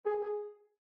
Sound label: User Account Control; Landscape